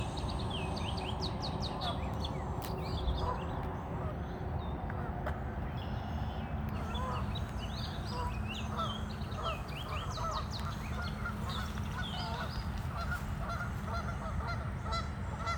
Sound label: oxmoor valley birdsong